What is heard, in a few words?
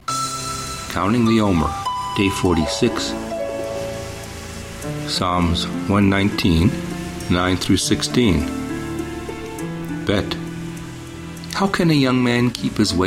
Meditative w Waves Ocean; Omer; Psa119